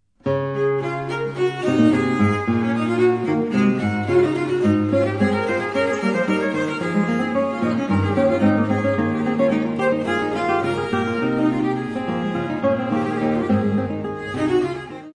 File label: Bach Triosonate 529 Allegro; hoppstock